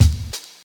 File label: short reverb kickdrum D# key, Royality free kick drum sound tuned to the D# note; kick drum samples